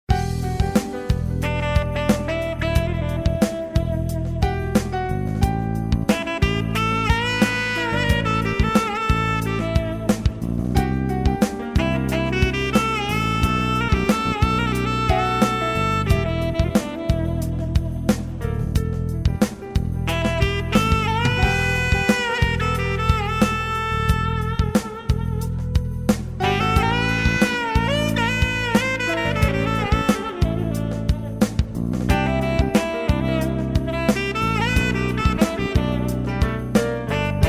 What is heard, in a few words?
Saxophone